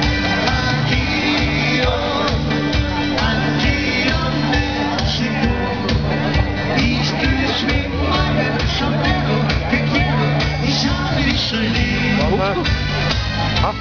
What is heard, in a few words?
bike; schweiz